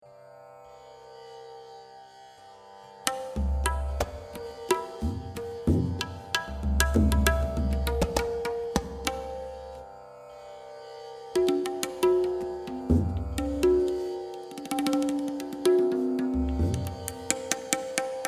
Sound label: Tabla Solo